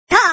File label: car